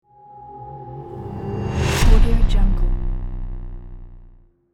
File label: hit mystical